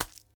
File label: kernel pult; Plants vs. Zombies